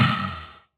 breath

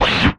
Swipe; retro arcade; MMRetroArcadeSoundsPack

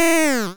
hurt; Free SFX for anything

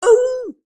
howl, creature

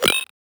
Computer Break; FREE SFX PACK VOL 2

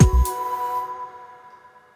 Vocal Pad; BB 2HTC Samples Vol 4; Solos

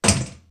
door close

